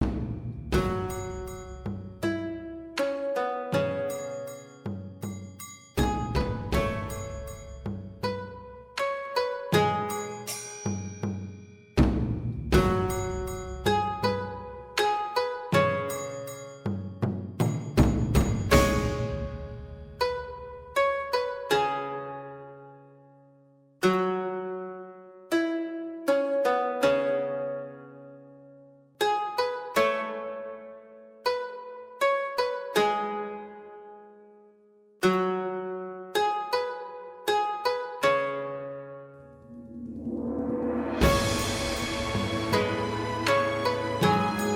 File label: japan koto music